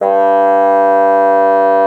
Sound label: MULTI, bassoon; Xsample VOL-6